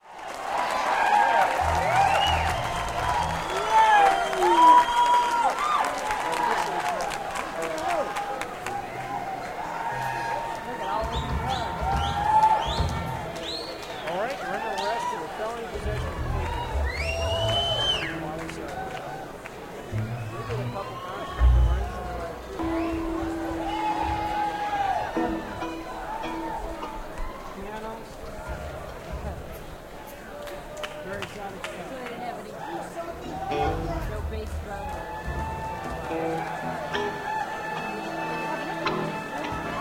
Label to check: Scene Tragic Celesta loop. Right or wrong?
Wrong